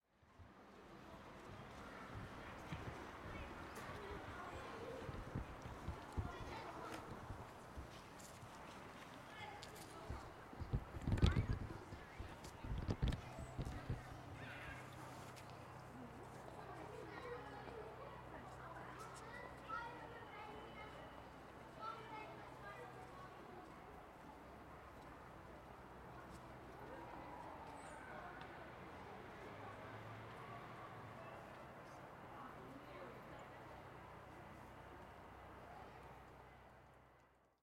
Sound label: pupils on street long